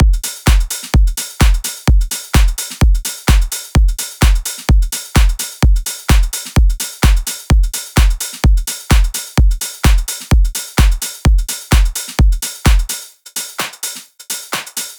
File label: Drum Loop - 128 BPM, house full drum loops; Pace; Cymatics